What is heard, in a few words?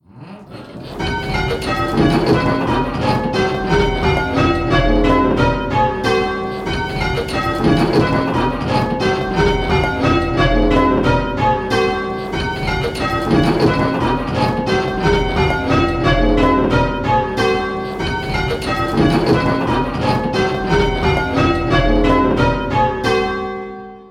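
Bells ringing; How many bells?; Pebworth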